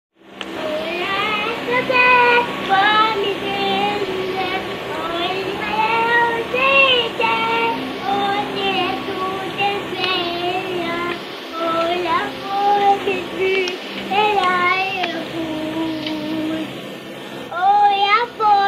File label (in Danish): Gyngesang